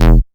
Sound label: Bass Shot E; Mutekki Media; Vengeance Electroshock Vol.2; Bass Shots